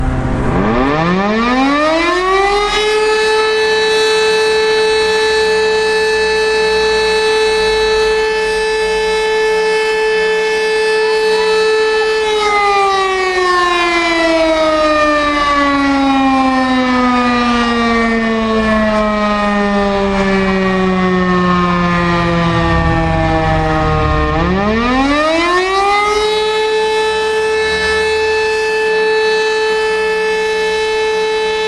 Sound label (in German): Feuerwehralarm